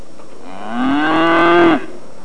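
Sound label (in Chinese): niu jiaosheng, cow mooing; jiachu jiaosheng; jiaqing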